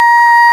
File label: ALTO, CHORAL; FAIRLIGHT CMI IIX